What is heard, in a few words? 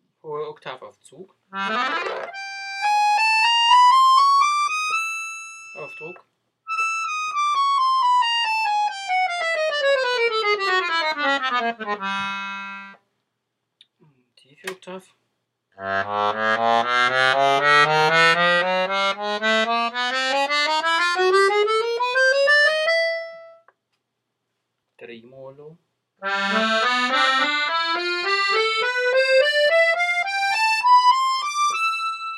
VICTORIA scales